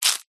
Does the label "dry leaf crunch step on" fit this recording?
Yes